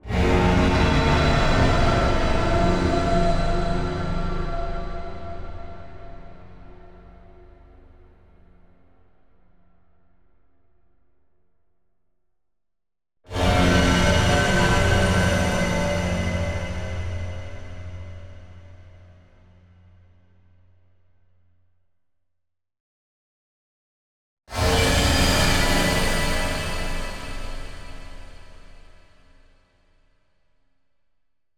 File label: Impact element Big Hit; MatiasMacSD; Affix